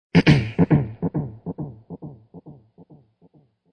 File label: Cough Echo, Male cough, echo, decay, w. FX, (human voice); Sick (Sound Effects:Human); VoiceBox